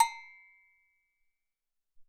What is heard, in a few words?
Lo Gogo